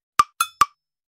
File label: percuss; huawei harmonyos